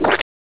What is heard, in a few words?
cricket splat; gearbox